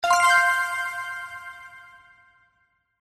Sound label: win Last